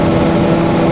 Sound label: TURBINE; MEGARACE